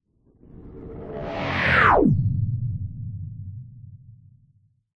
science fiction spacecraft small zoom by pass hypersonic; zapsplat